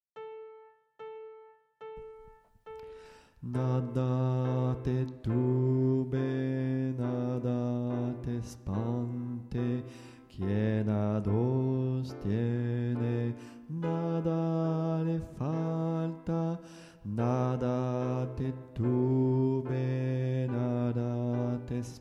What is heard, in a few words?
alto